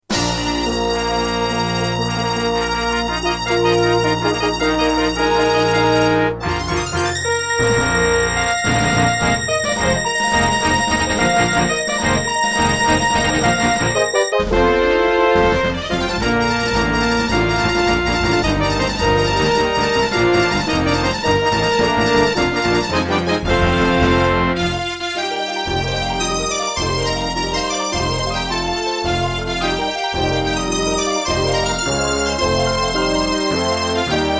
Intro, Pine PT-202 Vivaldi Wavetable DB; Dark Forces

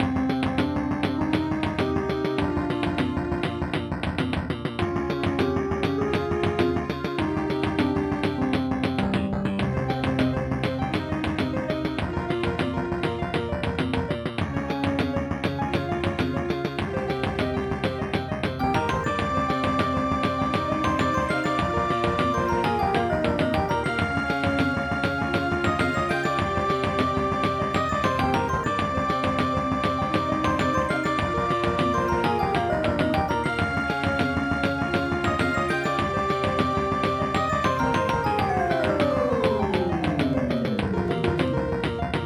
Alesis Harmony 32; Hocus Pocus

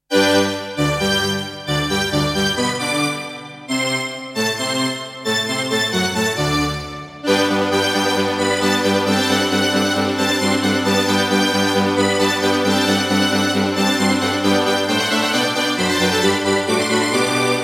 Yamaha TG100; Mozart - Eine Kleine Nachtmusik partial; classical

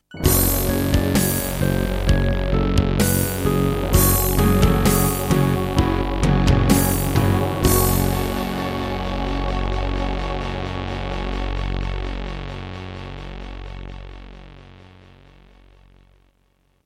Terratec MiniWaveSystem SOWT-11; Doom (Sigil) - Introduction

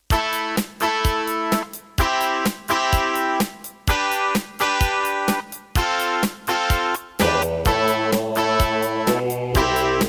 Roland SC-88 Pro 88MAP; raptor call of the shadows

